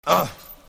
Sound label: anhh